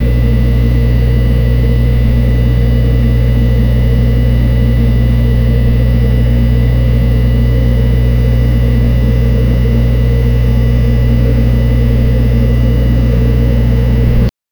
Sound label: the sound of the old; home appliances